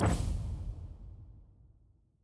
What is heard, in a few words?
click